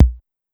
Kick Electronic, Drum Machines, Clavia DDrum AT, Pitched Up; 300 Drum Machines